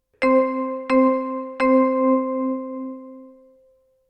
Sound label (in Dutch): ritme